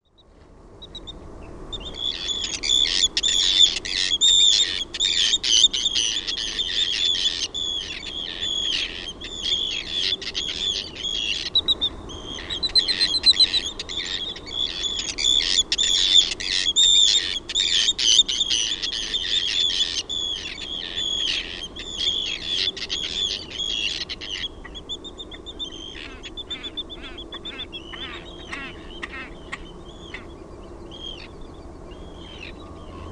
LAPINTIIRA, Linnut; OMAT AANITYKSET

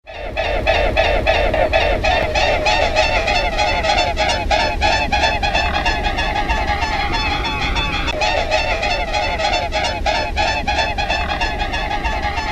KYHMYJOUTSEN, Linnut; OMAT AANITYKSET

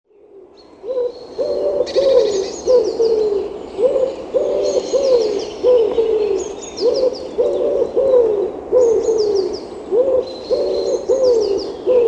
SEPELKYYHKY, Linnut; OMAT AANITYKSET

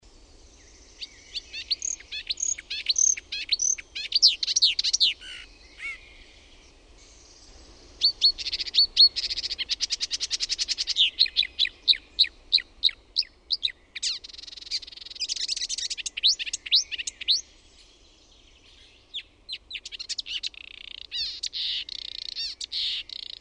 LUHTAKERTTUNEN

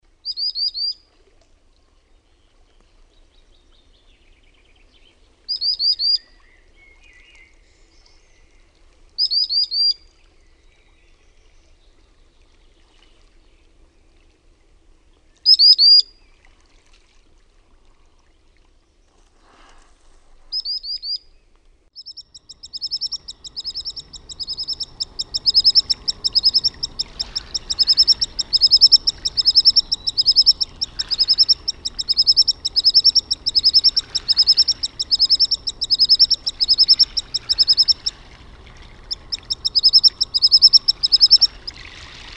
RANTATASIPI; LINTUAANITYKSET

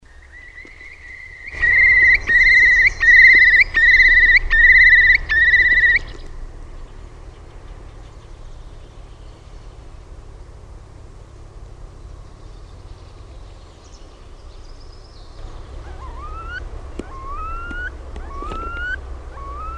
ISOKUOVI; LINTUAANITYKSET

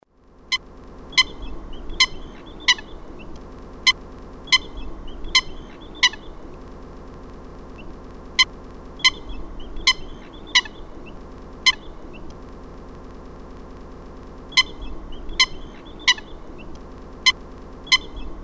NOKIKANA, LINTUAANITYKSET; OMAT AANITYKSET

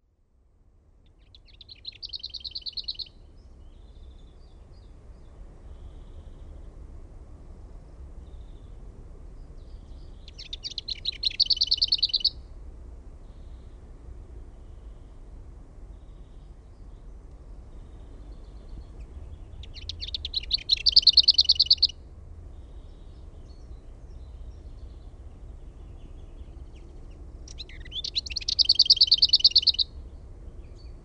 HERNEKERTTU, LINTUAANITYKSET

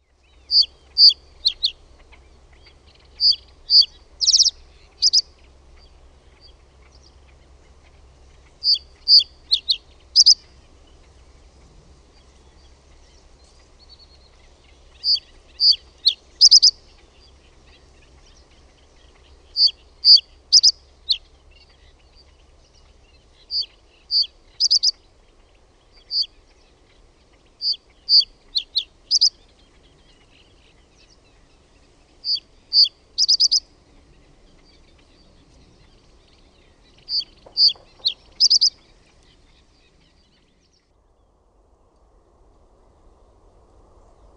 PAJUSIRKKU; LINTUAANITYKSET

